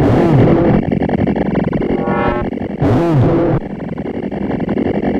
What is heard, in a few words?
key; Loops recorded mostly with a loop pedal, others made in audacity and software of that ilk